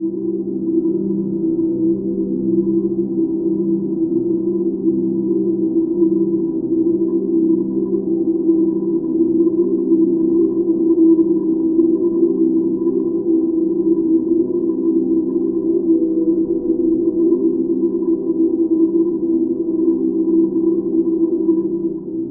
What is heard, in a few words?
Noises Calming Notes; AudioThing Hainbach Bundle